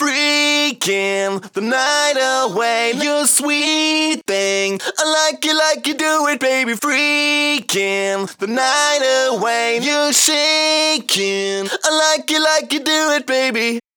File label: Pitched Vocals Chorus DRY, 140 BPM; Vengeance Samples; Song Kits